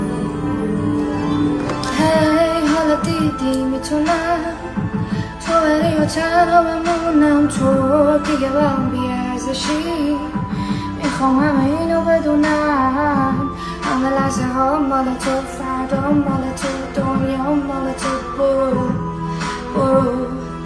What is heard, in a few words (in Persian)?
Ba Sedaye Zan